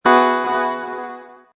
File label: Chord, Sci-fi, resonant synthesizer chord, reverberant